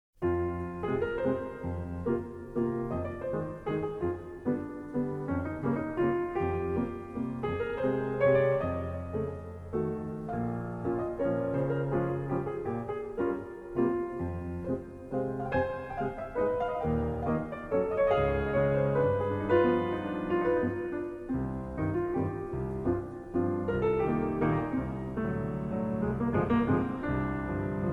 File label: Waltz In F Minor, PIANO; Masters of the Roll, Vol. 01; Horowitz